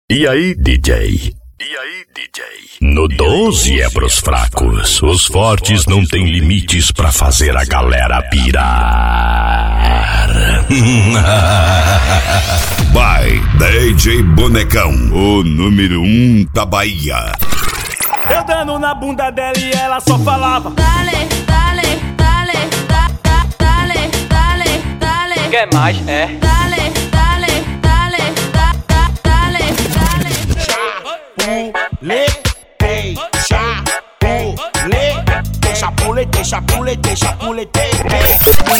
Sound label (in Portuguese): abertura brega